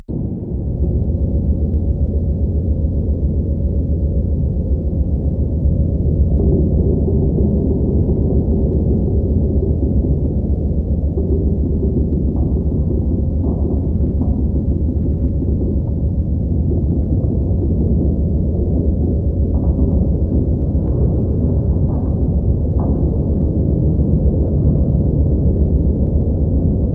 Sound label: Atmosphere Sub Amb